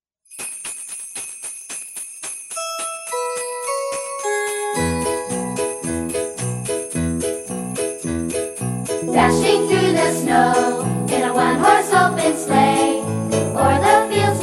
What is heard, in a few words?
Christmas jingle bells; Rainbow